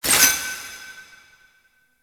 Citrine Crystal Pickup; Warframe; Pickups

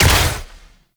Derelict Prime Gas Arrow Explosion Hit; Infested; Cernos; Weapons

